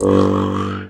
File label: MT G, JHARP G B; Xsample VOL-9